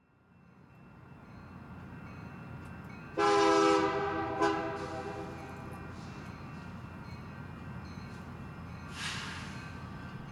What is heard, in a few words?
Freight Train, Binaural Recordings; Kevin Durr; Freight Trains Vol. 2; Oakland